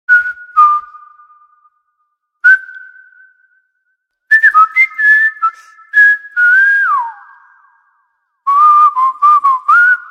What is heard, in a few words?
Whistle, ringtones; ghia productions; fun fx